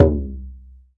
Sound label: WAYANG KULIT G2, Heart of Asia; Spectrasonics - Heart of Asia CD2